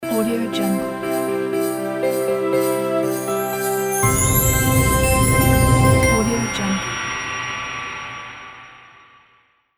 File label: christmas ident